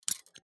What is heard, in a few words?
open box